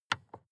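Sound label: sports car interior volume button press; verkehr transport; autos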